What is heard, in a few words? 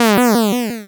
Cry